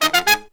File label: Pop & Funk Brass